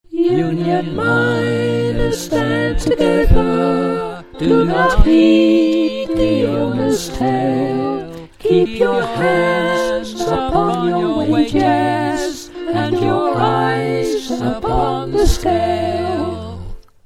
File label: chorus all